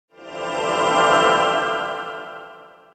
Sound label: Short twinkle pad wash up; Music effect; Hollywood Edge Sound Designers Tool Kit